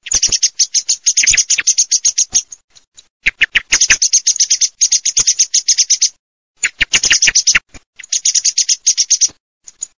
SCHIAMAZZO

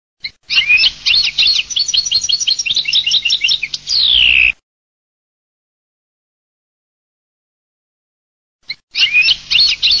CARDEL

